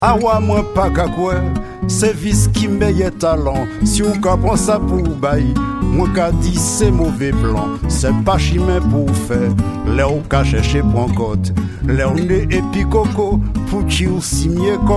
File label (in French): trompettes